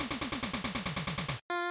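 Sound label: Game Boy Action Title Intro, bgm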